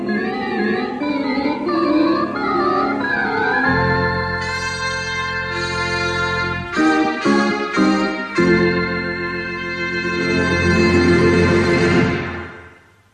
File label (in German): Tagesschaufanfare 1950er